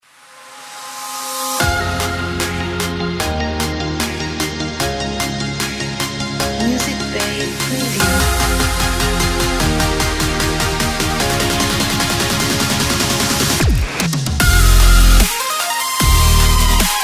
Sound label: Future Dubstep